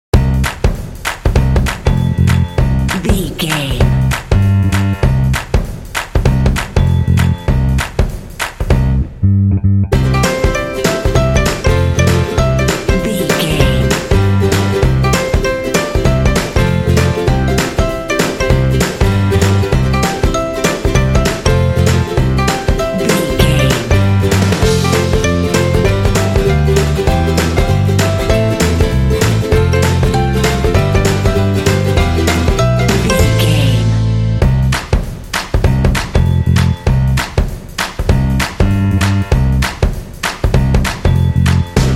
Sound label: fun happy advertising pop